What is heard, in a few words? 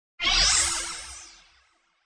Hgh Strnge, Fast high-pitched alien sounding tone.; Strange Tones; Sound Effects:Sound Design